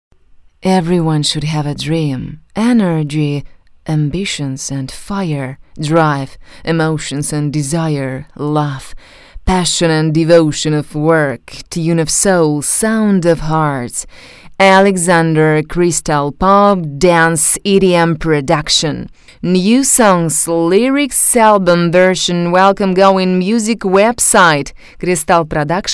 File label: Promo Jingle